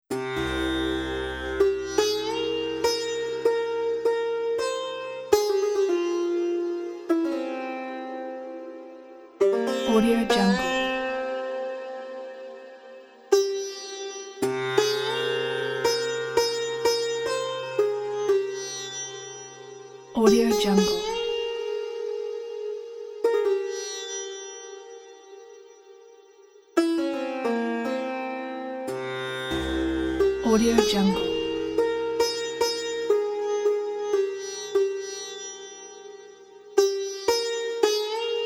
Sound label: sacred sitar